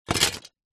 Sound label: plastic shards broken thin pieces set down; zapsplat